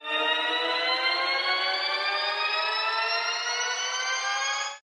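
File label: respin sound; longpao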